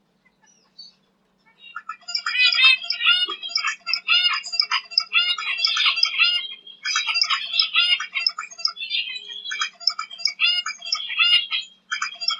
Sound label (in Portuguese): Canto do Diamante Mandarim